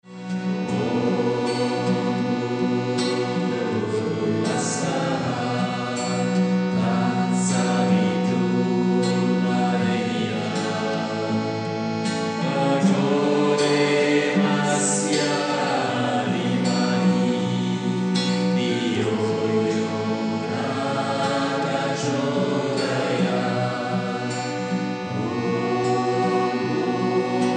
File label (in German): Mantren und Herzenslieder, gayatri